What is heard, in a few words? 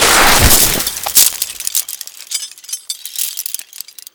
player big jump; Call of Duty Modern Warfare